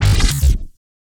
transition elastic; Alexander Kopeikin; Transitions HD; GDC Game Audio Bundle

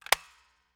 Foley Toy M16 Gun Pulling Trigger

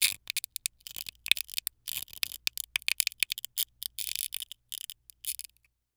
Crash Glass Sharp Grind Glass Debris Long; crashes; AdobeCC

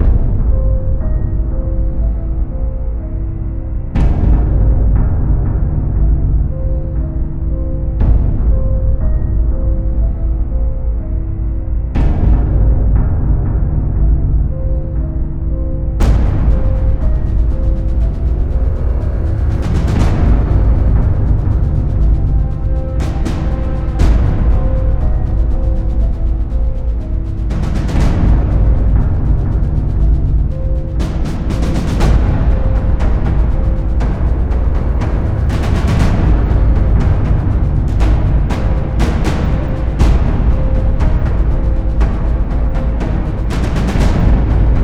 Machines Epic 120BPM; Cinematic Trailer Music; BlueFX

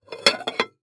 Dishes Remove From Stack Ceramic; household

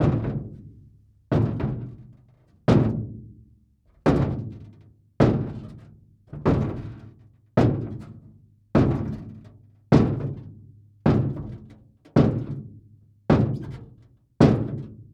Foley Footstep Work Boots Metal Walking; Foley Footsteps

